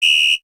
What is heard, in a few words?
whistle sound